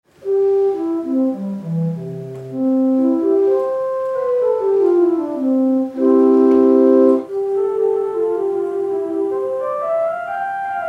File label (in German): Traversflöte, rundfunkorgel